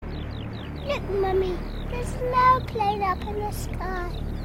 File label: Airplane in the sky